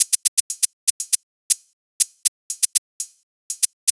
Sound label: Hats Beat, Electronic Beats; Sonic Foundry (Sony Creative Software); Myths of Technology